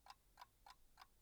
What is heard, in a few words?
clock ticking